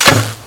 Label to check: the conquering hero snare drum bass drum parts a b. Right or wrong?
Wrong